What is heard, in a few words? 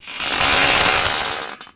roar; newman